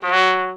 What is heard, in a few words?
Trumpet staccato F#2; Orchestral; DirectWave; Varazdin Orchestral